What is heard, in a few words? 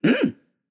win small mm; goldentavern